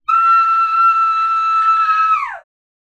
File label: Girl Screaming